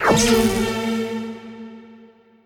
Powerup Pyramid

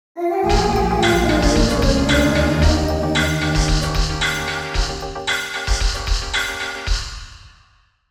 Death; donkey kong country 2 fan remastered

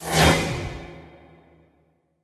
Teleport; Linirea Arcane Magus

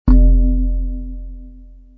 Hit Wood Dome

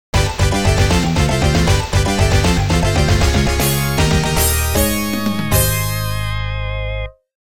Round Clear Music; Ordyne Original Game Soundtrack

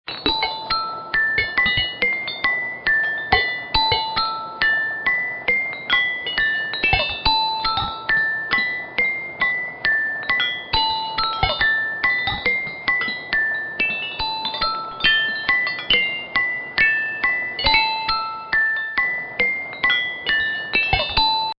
baby Mobile; Among the Sleep

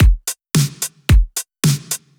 Music Disco DJ Drum Loop 1bar

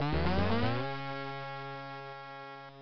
Accelerate; pac man kart rally java

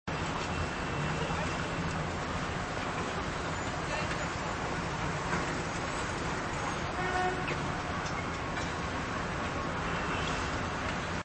ambient fair; coffee shop

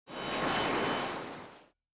transition out

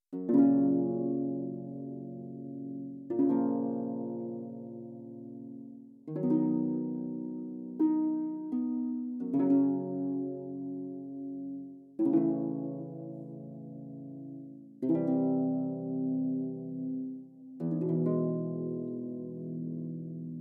Harps, i; Grand Ages Medieval

